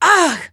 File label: uggh; Half-Life 2; alyx